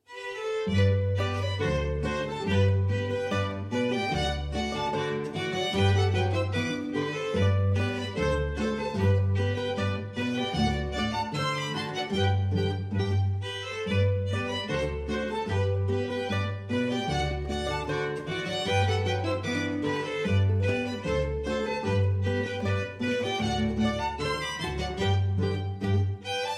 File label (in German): Boarischer